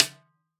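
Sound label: snare drum; 14x08; tama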